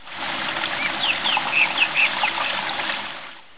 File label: FOUNTAIN; Caesar III